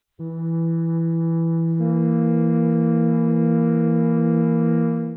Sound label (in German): flöte e1 b1; Intervalle, die man durch Potenzen von 2 und 3 darstellen kann